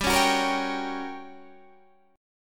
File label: G Minor Major 7th Flat 5th, G, down, Guitar, Open D